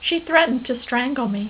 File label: strangle